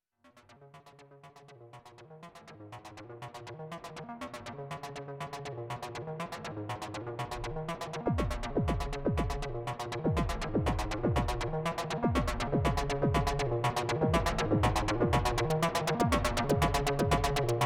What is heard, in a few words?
september noise